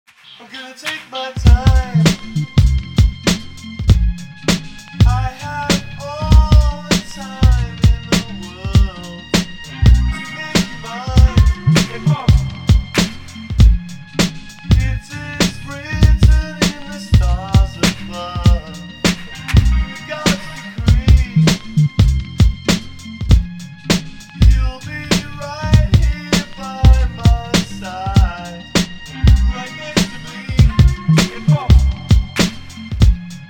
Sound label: motorbass